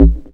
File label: techno, BASS; Techno Trance Essentials